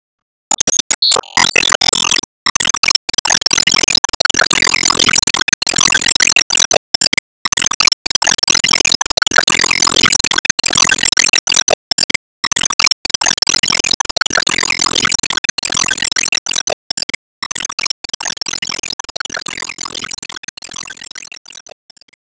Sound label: Enemy Jet Active Charge Loop; Disney Star Smash; Android, iOS, Mobile